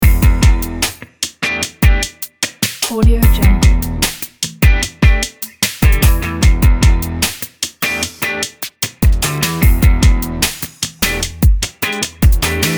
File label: stylish funk